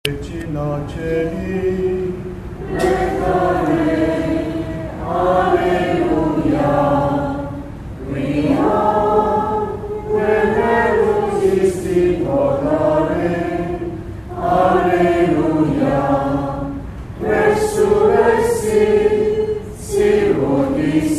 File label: Regina Coeli in chapel; easter 2nd 2014